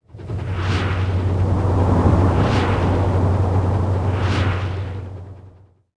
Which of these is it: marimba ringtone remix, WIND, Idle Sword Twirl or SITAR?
WIND